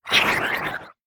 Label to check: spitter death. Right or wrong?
Right